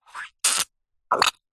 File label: foley liquid gel suck in then squelch splat